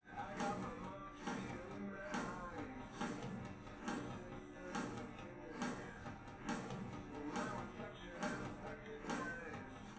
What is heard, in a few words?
drums live rec